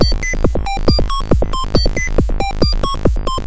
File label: 138 bpm, offphase bleep rhythm, drum loops and breaks